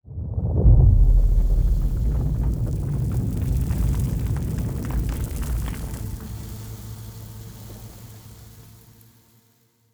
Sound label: falling rock; rifts; Stellaris; astral planes